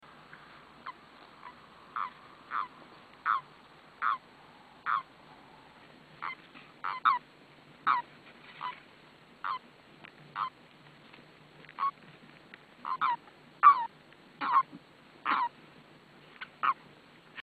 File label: bufo bufo call; mike kruger